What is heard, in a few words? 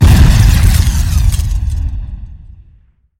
ship Explosion; AsteroidPatrol3D